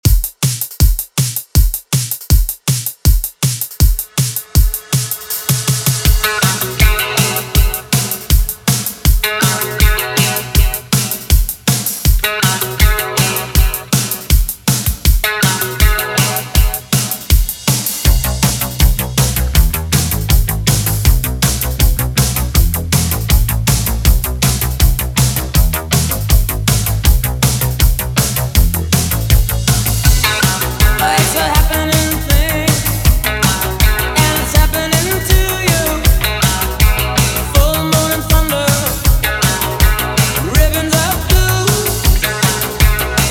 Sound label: rain 160bpm; Latin beats; Prodeejay Remix